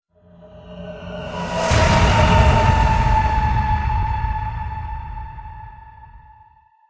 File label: Stinger Swell 5 Seconds